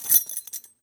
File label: Foley Keys Belt Metal Jingle